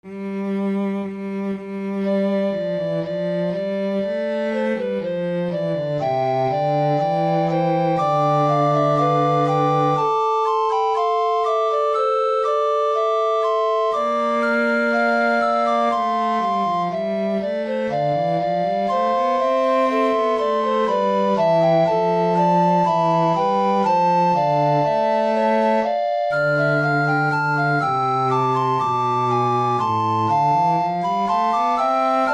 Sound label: recorder quartet